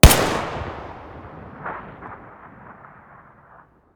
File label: tt33 muzzle mono